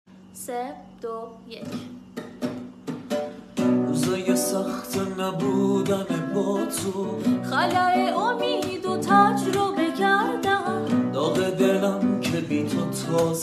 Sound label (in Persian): صدای زن و مرد